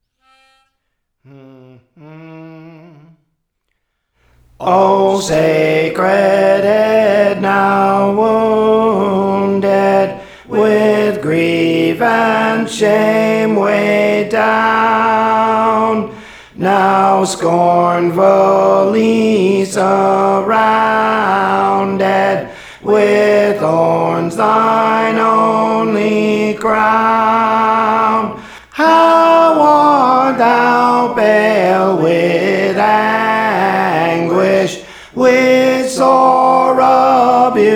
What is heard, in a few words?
Tenor, Songs; Praise For The Lord Training